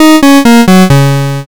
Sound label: gameover